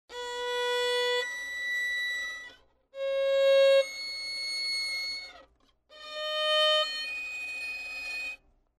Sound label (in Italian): VL Armonici Artificiali